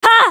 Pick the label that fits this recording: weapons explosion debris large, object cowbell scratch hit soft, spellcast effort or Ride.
spellcast effort